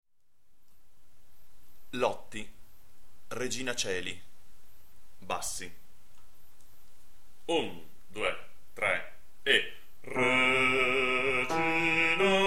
Regina Coeli Bassi

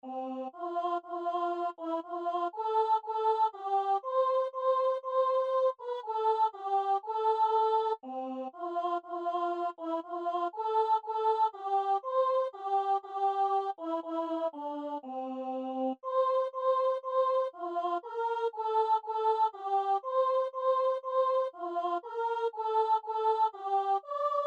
Cantan gli angeli soprani

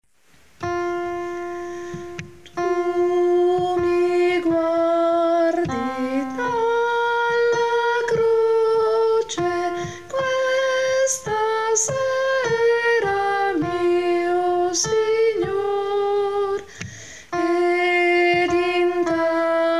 tu mi guardi dalla croce soprani

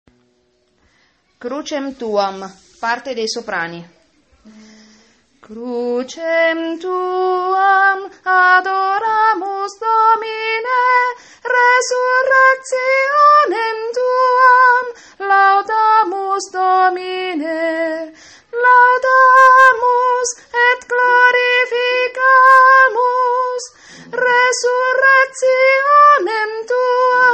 soprani

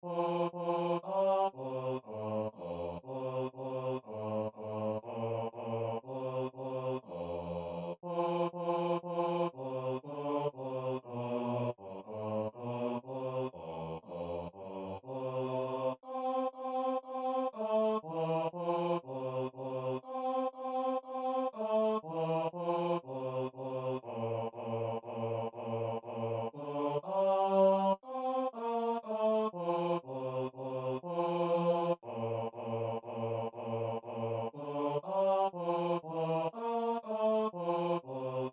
bassi; Cantan gli angeli

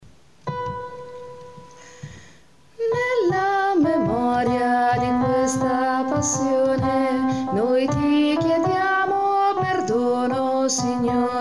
donne

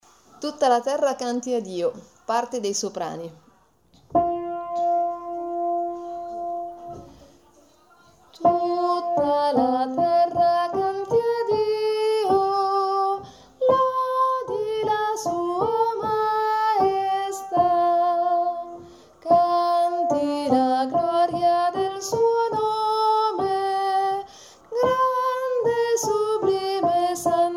Tutta la terra canti a Dio soprani

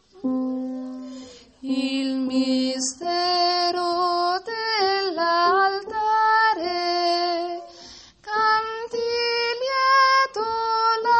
pange lingua soprani

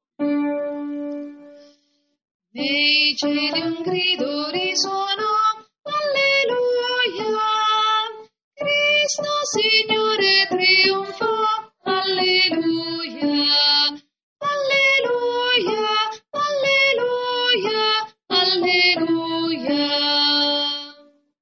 nei cieli un grido soprani

